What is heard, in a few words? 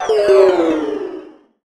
gloomy; gyakuten touhou